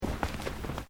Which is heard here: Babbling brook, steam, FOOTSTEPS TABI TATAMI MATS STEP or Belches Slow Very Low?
FOOTSTEPS TABI TATAMI MATS STEP